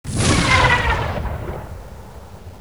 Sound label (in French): fin tuyau; de rats caravan